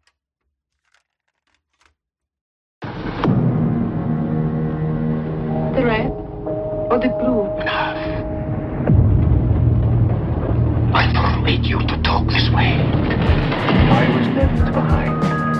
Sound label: Instrumental Hip-Hop Lofi Music